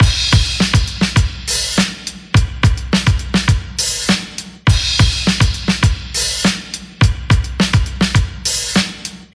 103 bpm breakbeat g sharp key, Free drum groove - kick tuned to the G# note. Loudest frequency: 2413Hz; drum loops